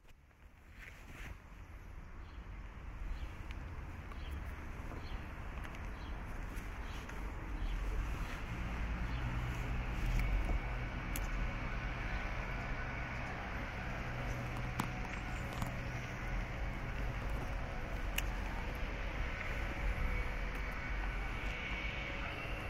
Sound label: Explorative Music Clarinet; Excerpt Explorative Music